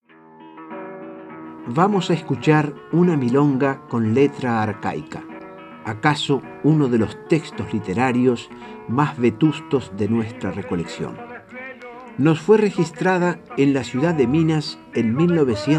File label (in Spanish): milonga